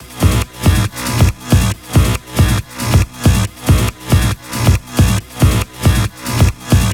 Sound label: Special Sounds Root B 138 BPM; vengeance essential club sounds-3